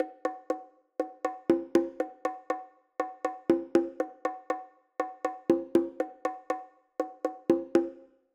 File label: Bongo Pattern